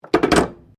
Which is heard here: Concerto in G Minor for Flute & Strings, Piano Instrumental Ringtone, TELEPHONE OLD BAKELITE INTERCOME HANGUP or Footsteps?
TELEPHONE OLD BAKELITE INTERCOME HANGUP